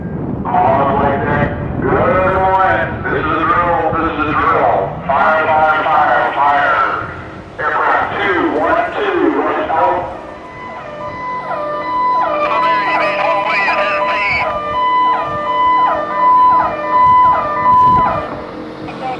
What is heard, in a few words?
flight deck fire; CVN65